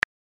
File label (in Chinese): 苹果手机振动